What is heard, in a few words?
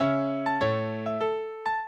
minuet, mozart